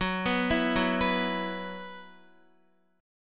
Gb 7th Suspended 4th Gb down Guitar; Gb7sus4 arpeggiated; 4E,4A,5D,5G,5C,6F

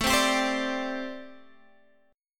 D 7th Suspended 4th, chord

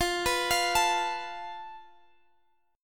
F Major Flat 5th, Guitar, Half Step Down

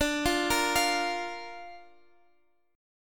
D Minor Sharp 5th D Guitar Half Step Down

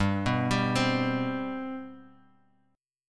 G Major Add 11th, G; Gadd11 arpeggiated; JGuitar

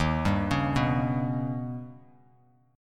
D sharp Augmented 7th D sharp, Bass Standard; arpeggiated; 11,10,11,4; JGuitar

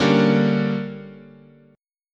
D Major 7th Suspended 4th Sharp 5th, chord

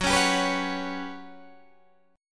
G Major 7th Flat 5th F sharp

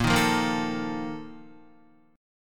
Bb Major 7th Suspended 4th, Guitar

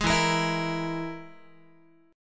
E Major Flat 5th, Guitar; strummed; JGuitar

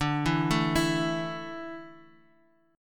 D Suspended 2nd, Guitar; arpeggiated

